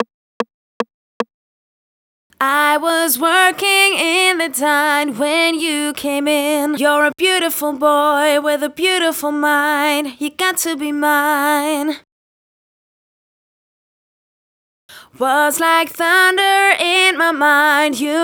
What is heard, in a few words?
Verse (Boy), 150BPM; Vengeance Samples